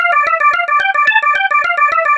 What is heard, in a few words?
Organ Horror Sound